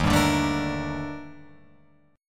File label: D sharp Major Sharp 11th D sharp down, Guitar Open F; strummed; JGuitar